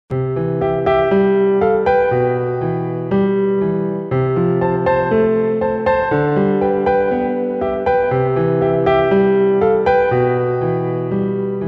instrumental

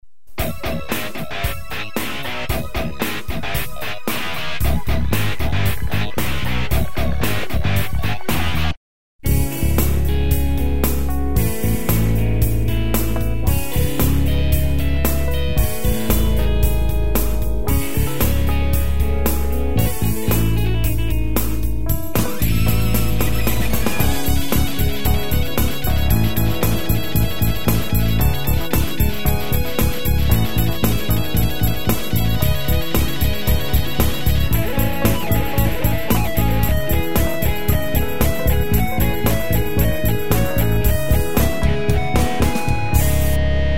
guitar eletric sound

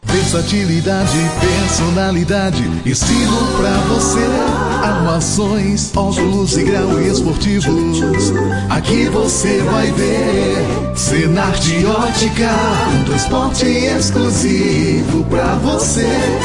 jingle, vocal; cenart otica

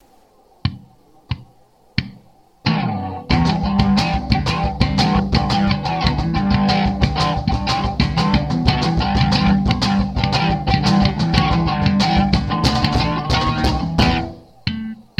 funk rock

